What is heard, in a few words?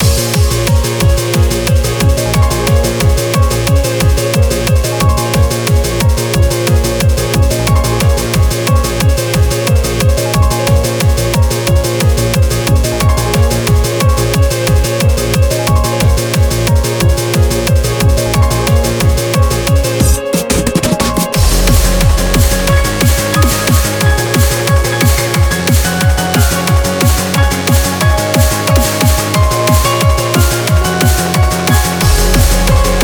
boss loop; Tower of the Approval